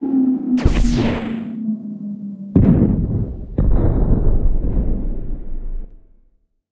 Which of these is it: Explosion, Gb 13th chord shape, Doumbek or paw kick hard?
Explosion